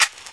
Flare Ignite Dry; Playable Tutorial Level

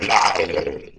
Lizard Man Death; Playable Tutorial Level; TR3

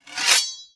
Knights Sword Scrape; Playable Tutorial Level

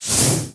Plasma; Sett; Playable Tutorial Level

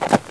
Centaur Hooves; Playable Tutorial Level; TR1

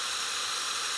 Exhibit Mummy Headspin; Playable Tutorial Level

